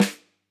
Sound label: close Snr, drum